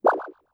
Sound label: jump up; 落下音・はねる音